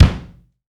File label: KICK, LA KICK; AKAI S6000 CD-ROM - Volume 3